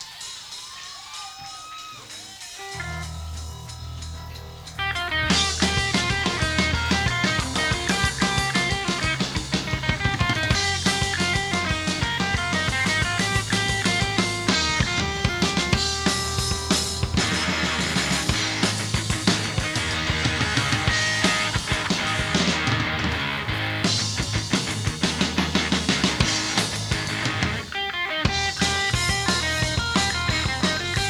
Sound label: instrumental; 7th Street Entry; Minneapolis; MN